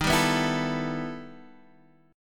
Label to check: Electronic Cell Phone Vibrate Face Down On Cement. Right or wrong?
Wrong